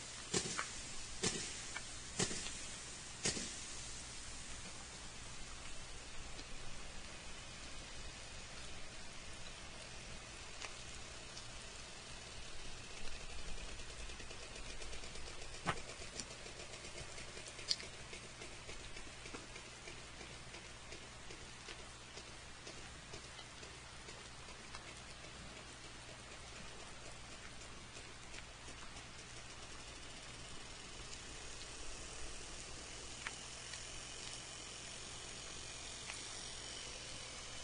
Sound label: sperm buzz; limekiln; Yukusam